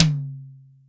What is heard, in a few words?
large room tom drum c sharp key; Duration 0:01; Mono